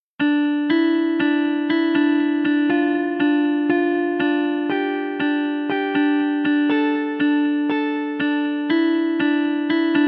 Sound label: Documentary Guitar